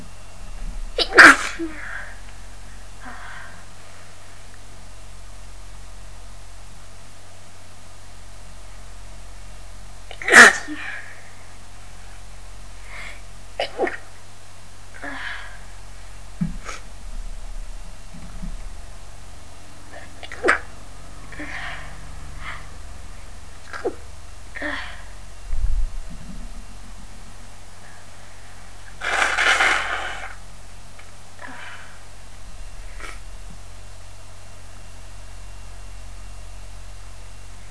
stifle attempt, female

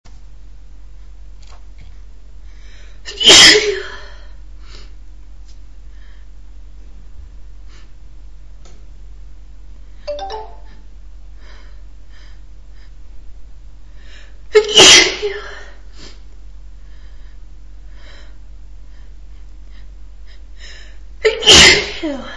air freshener, female

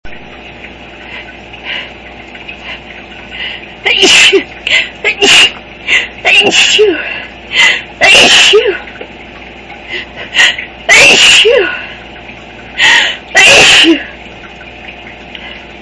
strong, female

